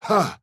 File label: Attack Medium